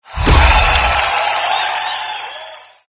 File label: boom